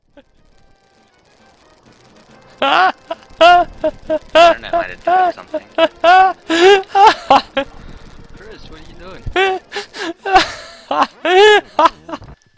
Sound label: laugh